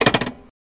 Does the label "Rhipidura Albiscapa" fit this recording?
No